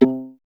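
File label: strings viola C; Miroslav Vitous; Solo Instruments VOL-1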